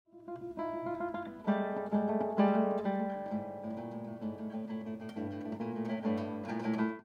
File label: Timbres; Ginastera